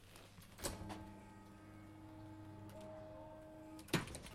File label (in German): automatische Türöffnung; automatischer Türöffner